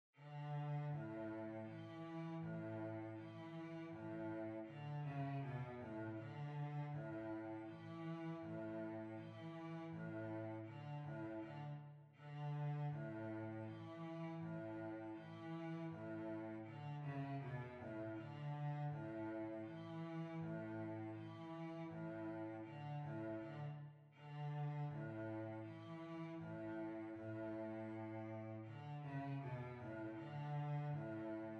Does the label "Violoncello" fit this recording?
Yes